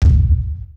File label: Claymore Mine Detonation; Weapons & Firearms; Sony Pictures Sound Effects Series Volumes 1-10; Sony Creative Software